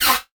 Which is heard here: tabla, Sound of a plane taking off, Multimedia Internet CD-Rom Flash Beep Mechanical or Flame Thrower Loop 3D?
Multimedia Internet CD-Rom Flash Beep Mechanical